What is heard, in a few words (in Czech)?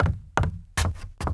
kroky drevo; Mafie 1